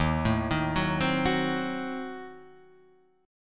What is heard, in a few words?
Eb Diminished 7th Eb, Guitar Half Step Down; Ebdim7 arpeggiated; JGuitar